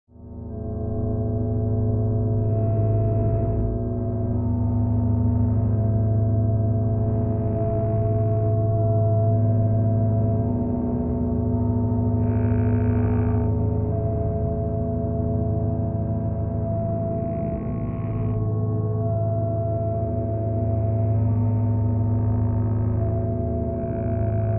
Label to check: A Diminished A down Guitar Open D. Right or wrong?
Wrong